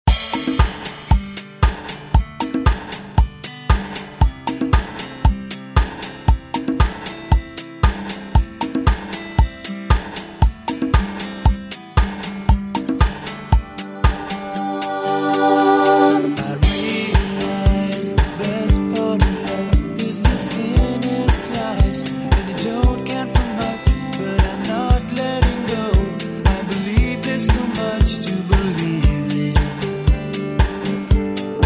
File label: Re Drum